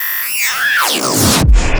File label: Oversampled glitch; NEBULA